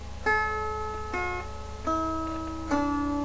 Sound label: tuning; folkemusikk; fela